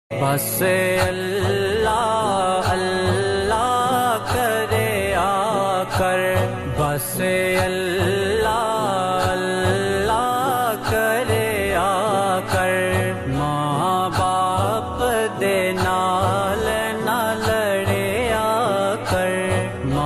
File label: Islamic Ringtone; kalma sharif